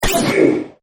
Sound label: home arrow click